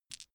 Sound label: dice clatter